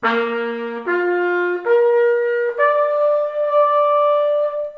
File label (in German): das ganze, jagd